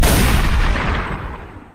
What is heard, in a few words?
realistic gunshot sound